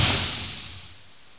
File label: fire extinguish; XDM